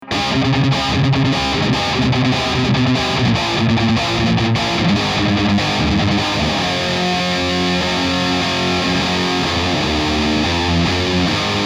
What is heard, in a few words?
High Gain, MultiFX; iRig UA